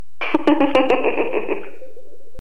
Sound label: Brit Laugh